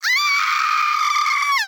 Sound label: "gyaaa", girl